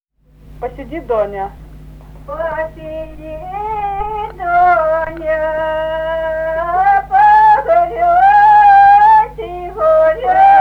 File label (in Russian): лирическая; Доброе; Посиди, Доня